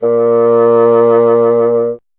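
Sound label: wind bassoon A#; Miroslav Vitous - Solo Instruments VOL-1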